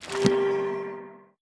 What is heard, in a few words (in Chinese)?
附魔台附魔声